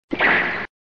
whip single strike

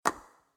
clap drum pad singular; artlist original

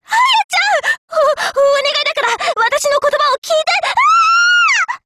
scream; Chisato

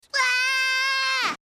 scream; bart

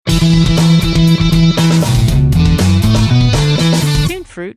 hyper stinger drums

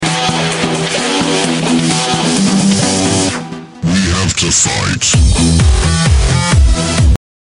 electro

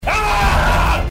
roar

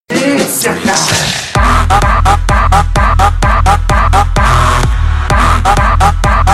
trumpet; jailson

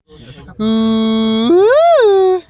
uuuuuu

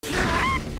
yelp; bakugo

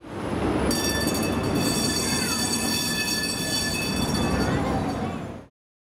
tram bell

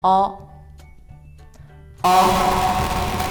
oh ohhhhhh

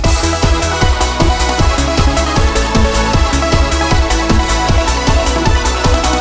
diamond notif

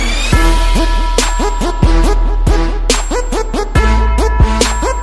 hava nagila trap remix

